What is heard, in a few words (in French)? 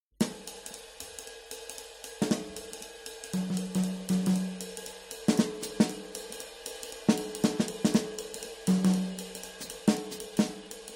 batterie